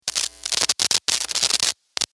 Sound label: sparks, Electric Spark; Sustained Hum And Spark, From Guitar Cable; Zaps; Electronics